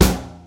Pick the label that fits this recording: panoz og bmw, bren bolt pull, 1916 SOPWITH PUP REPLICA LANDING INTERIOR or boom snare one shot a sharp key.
boom snare one shot a sharp key